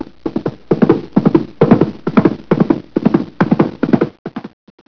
GALLOP; psion cd vol1

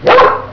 BARK; psion cd vol1

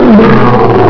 LION ROAR; psion cd vol1

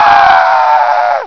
"AAHHHH!"

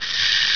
SPRAY; psion cd vol1

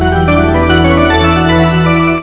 Accordion

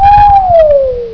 Whistle Slow

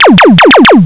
LASER

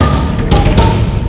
Tock Ta Tock Tock; psion cd vol1

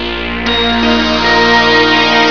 MELODY; psion cd vol1

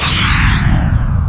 Pfftschuu; psion cd vol1

